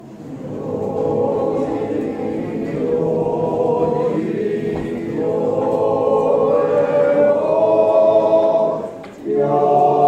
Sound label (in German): Adventjodler; Weihnachten 2019